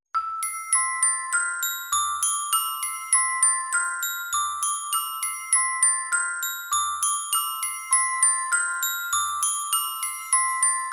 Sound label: Christmas Bell Tune; kingfisher christmas samples